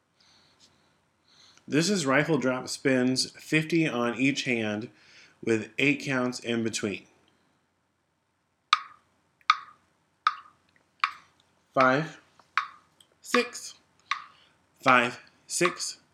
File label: drop spins slower